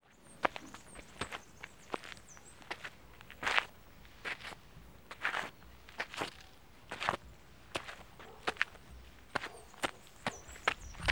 Pasos De Hombre En Tierra Lugar Abierto

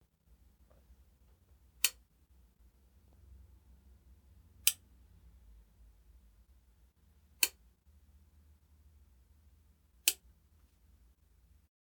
Boton

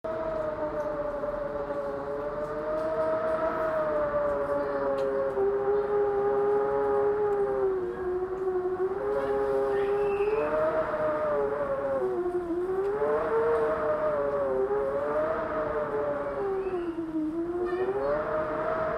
Viento

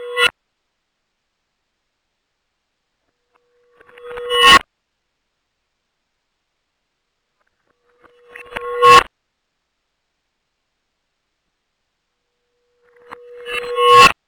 Transiciones

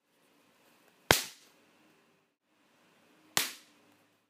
Rompe Cuerda

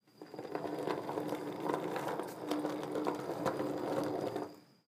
Ruedas De Carrito

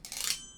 Destello

